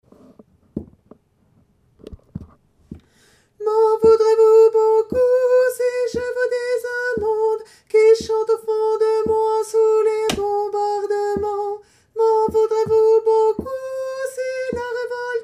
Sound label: tenor